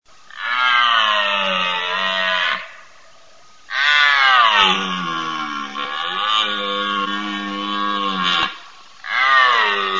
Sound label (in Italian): CERVO, birdsound